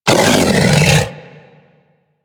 Feral Vocal Downed Struggle; Warframe; Kubrow

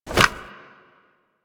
Remove From Back

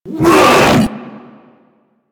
Infested Jetpack Attack Melee Swipe; Warframe

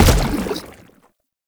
Step Default, Foley Footsteps; Enemies; Warframe; Lasrian Soldiers; Lasrian Chem Strike Nox

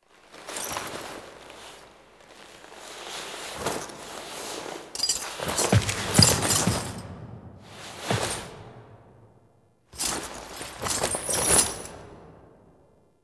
Romance Cin Foley B; Roathe; Warframe; Tau Romance Cinematics

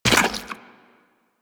Run Goo, Footsteps; Warframe; Tenno

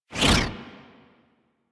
Fly By C; The New War; New War Intro Moon; Archwing

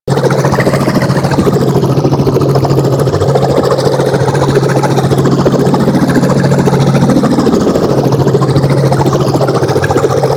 Combat Pod Forward Fast Loop; Vehicles; Crp Combat Pod

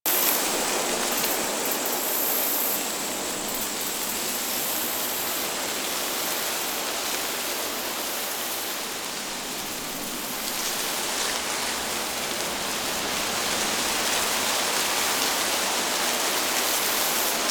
Exterior Foreground Forest Loop; Ambience; Duviri; Warframe

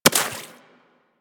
Bullet Impact Water; Warframe; DE; Weapons

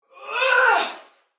Death, Female, Soldier; Dialog; Barks; Battle; Sinecure

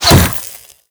Glass Unbreakable Impact

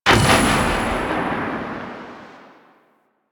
Blinded Impact Affect; Warframe; Warframes

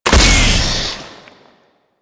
Secondary Barrel Fire, Weapons; Warframe; SU Modular Guns

